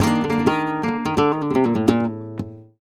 Remates De Pulgar, WAV Guitar, Flamenco

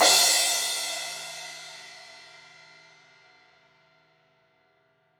Crash 16in edge, Cymbals; Deeply Sampled Drums

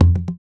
African Perc

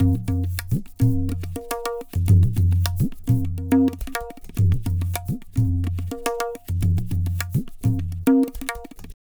Tabla, 105 BPM; Zero-G; Pure Tabla

